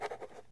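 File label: quick writing of a love letter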